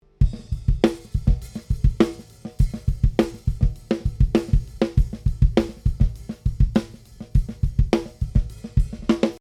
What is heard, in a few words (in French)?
grosse caisse et caisse claire dessus seul, sans effet ni EQ; tout nu comme je les ai pris